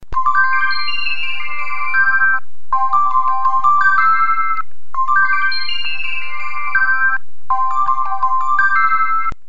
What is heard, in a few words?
Chimes Alert Sms